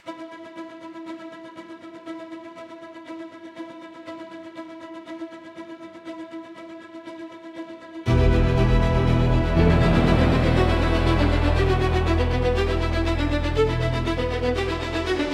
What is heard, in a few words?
epic hybrid orchestra for cinematic